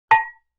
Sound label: Cowbell High Damp; Vintage Cartoon; Drums & Percussion